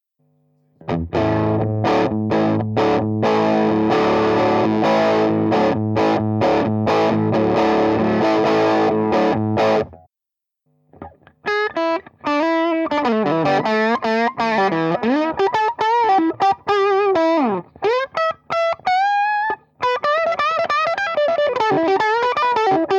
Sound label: Alnico Junior